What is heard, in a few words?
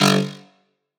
Hexagon Synth G